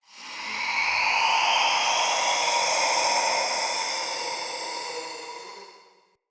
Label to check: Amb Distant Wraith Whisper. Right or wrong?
Right